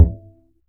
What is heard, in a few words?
Upright Acoustic Bass; 1ST POSITION; Larry Seyer